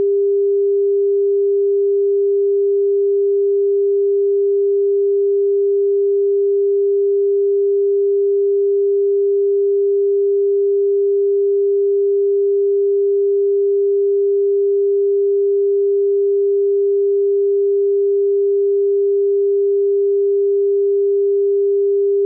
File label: 400Hz Mono 12 DB Down From FSD; 400 Hz Tone, Mono, 12 DB Down from FSD